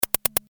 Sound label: BR Hat 135bpm, Drum Kit Hats; Crowdsourced Sample Pack; TRUTH-HZ